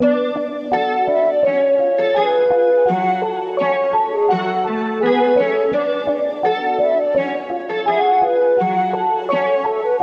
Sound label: Various Melody Loop 168 BPM F# Min; Cymatics; Oracle Sample Pack